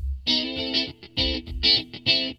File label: GUITAR, ROCK POP; MAGIX